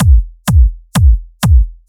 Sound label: Kick, 126 BPM; Vengeance Samples; Vocal-Hook Kits; Song Kit